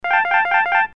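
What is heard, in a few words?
peasant scream arcade; trogdor soundboard sfx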